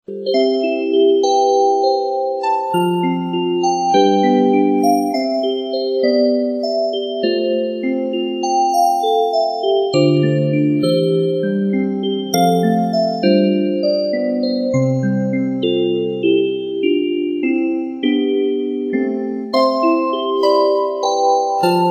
上课铃声; 信号类

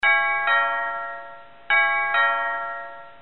提示音; 学校常用铃声

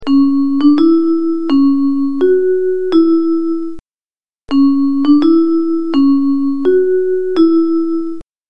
铃声 上课铃; 报时; 报时430

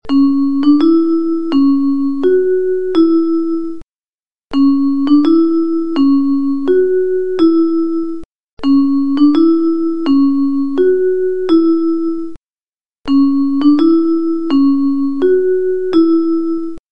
上课铃声; 信号类

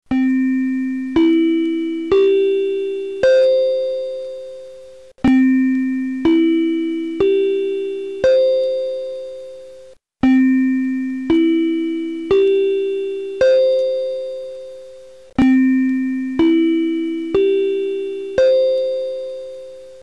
上课铃; 学校常用铃声

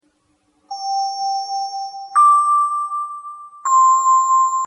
音乐铃; 报时; 报时430

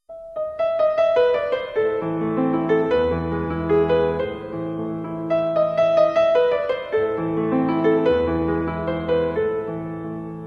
上课铃; 信号类; 致爱丽丝上课铃

